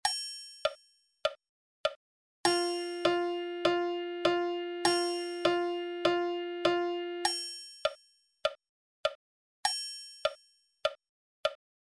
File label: music 2 notes